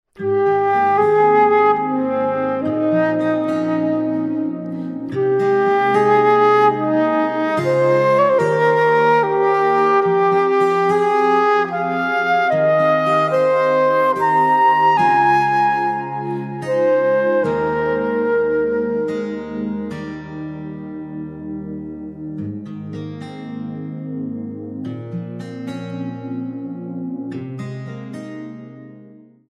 wersja instrumentalna / podkład; Krakowskie Centrum Artystyczne

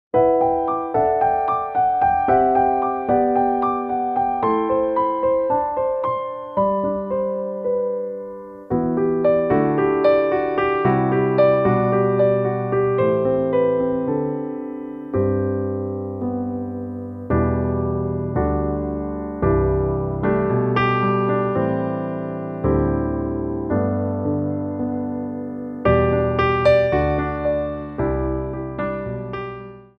wersja instrumentalna / podkład; Wersja instrumentalna do piosenki; Krakowskie Centrum Artystyczne

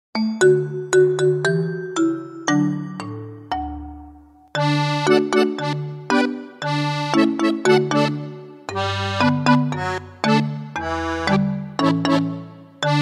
Wersja instrumentalna; Wersja instrumentalna do piosenki; podkład; Krakowskie Centrum Artystyczne

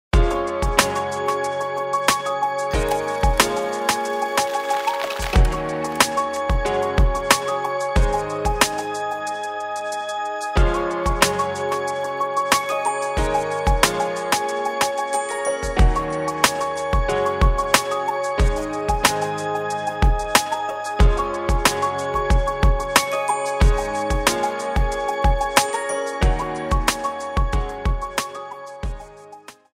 instrumental, Wersja instrumentalna / karaoke; Wersja instrumentalna do piosenki; Krakowskie Centrum Artystyczne

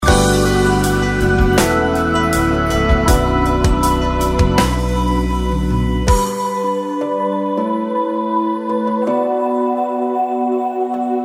wersja instrumentalna / podkład; Krakowskie Centrum Artystyczne

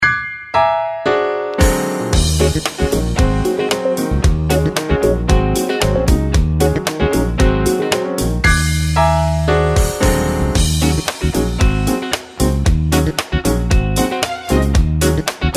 instr

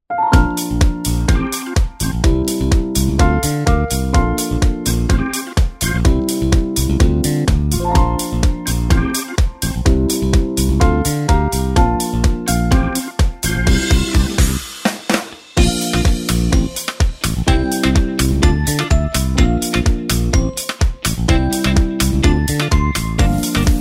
wersja instrumentalna / podkład; Wersja instrumentalna do piosenki; Krakowskie Centrum Artystyczne

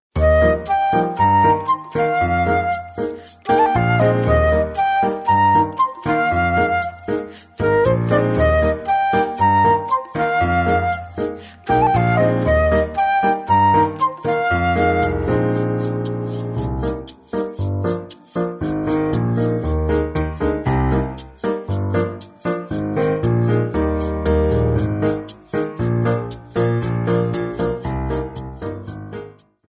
wersja instrumentalna / podkład; Krakowskie Centrum Artystyczne

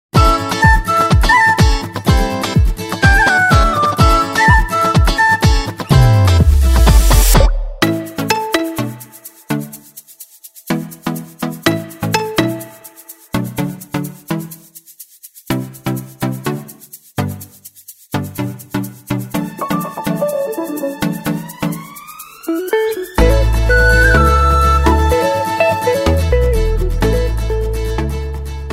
instrumental, Wersja instrumentalna; karaoke; Krakowskie Centrum Artystyczne

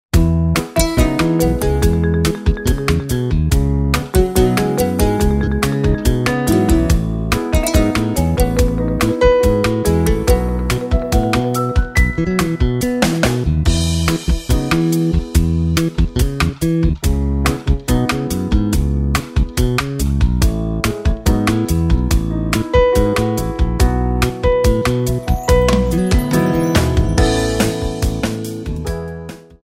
wersja instrumentalna / podkład; Wersja instrumentalna do piosenki; Krakowskie Centrum Artystyczne

